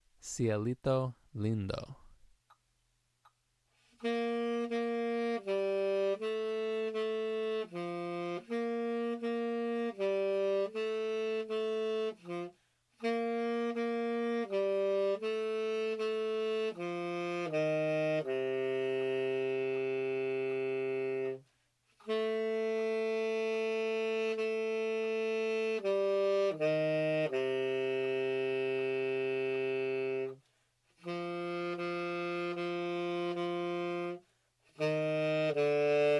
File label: tenor saxophone